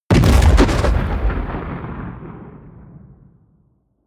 bomb fragmentation close

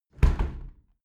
window close tilt heavy sequence

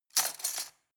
cutlery plastic multiple drawer place

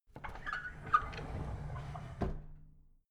drawer big squeak close soft

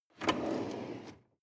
drawer medium open draw short

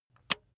razor attachment retract

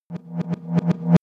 reverse hit rhythmic wobble

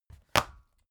folder close hard

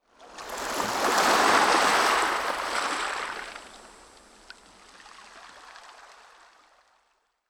coast oneshot surf soft

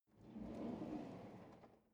drawer cutlery draw out slow

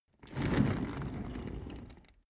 office chair trundle fast long

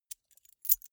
key ring small place soft

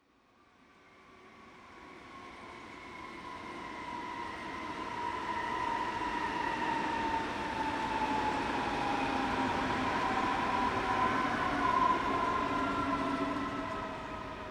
train city arrive short

